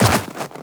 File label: Run Snow, footsteps; Warframe; Tenno